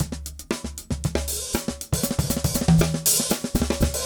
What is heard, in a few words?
straight busy; odd loops